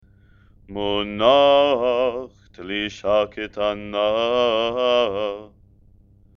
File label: haftarah, trope